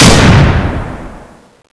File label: elite fire; cstrike